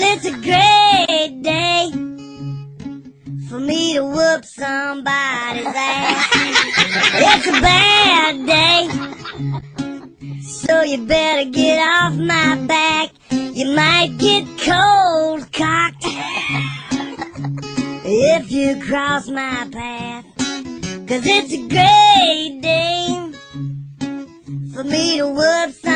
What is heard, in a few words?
Whip